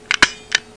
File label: Stamper; LIGHTBOX 1.04